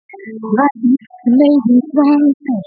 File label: Separated Voiced Mixture